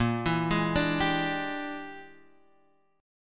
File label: E 7th Sharp 9th Flat 5th A sharp, guitar